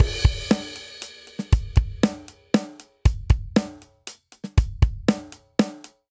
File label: Straight Three Rock Verse 3/4 118 bpm; MIDI Clips; Drum; Ableton; Factory Packs; Drum Booth